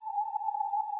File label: CW LFM QRM